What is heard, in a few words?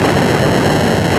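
Battle Boss skill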